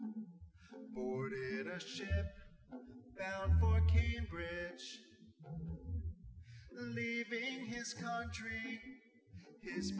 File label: nodrums; SiSEC08; SiSEC underdetermined